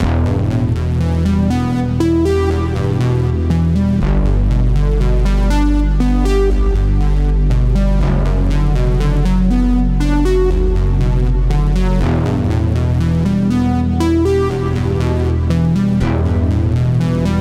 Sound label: Arpeggios